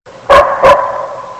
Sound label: zo idle, wolf; svencoop; legends